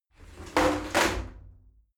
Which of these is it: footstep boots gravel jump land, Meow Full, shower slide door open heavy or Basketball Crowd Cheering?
shower slide door open heavy